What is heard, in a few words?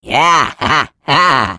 Defective Turret defect laugh; sp sabotage factory